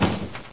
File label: latch locked, buttons; Source Engine; dod